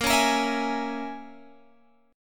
A sharp Minor 7th Flat 5th A sharp, Guitar Open G

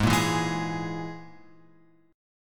G sharp Suspended 2nd Sharp 5th, guitar chord; G#sus2#5 Chord {4 1 2 1 X 4} strummed

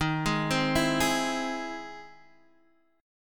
E Augmented Major 7th D sharp

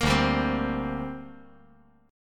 D 7th Suspended 4th Sharp 5th down, chord

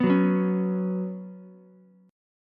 Db 7th Suspended 4th Db down Ukulele; JGuitar

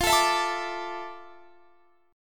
D Suspended 2nd F; strummed

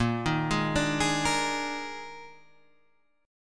D sharp Major 7th Suspended 4th, A sharp

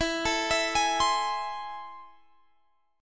A Minor Major 7th, E

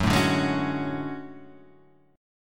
D Major Sharp 11th F sharp, chord

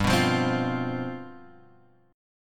G 11th G, chord

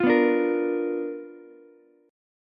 B Minor Major 7th Flat 5th Ukulele; strummed; JGuitar

